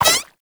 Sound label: Shrink Baby Jump; Warframe; Nokko